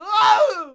Archer Die; Sid Meier's Civilization 4 Complete; Warlords; Units

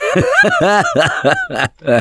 Laughter, Survivor Voice; Gambler; russian

